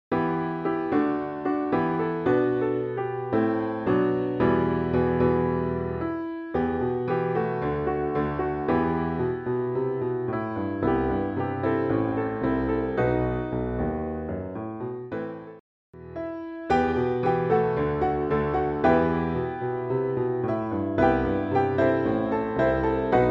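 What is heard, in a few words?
Accompaniment; Verses for Advent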